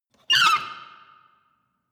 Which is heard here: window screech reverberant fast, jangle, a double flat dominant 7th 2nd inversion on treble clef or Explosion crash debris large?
window screech reverberant fast